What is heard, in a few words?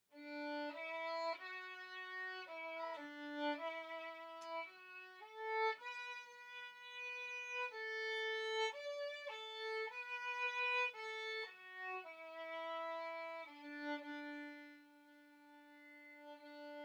Down by Salley Gardens Lower Octave